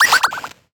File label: Retro FX; Cymatics; Video Game Sample Pack Vol 1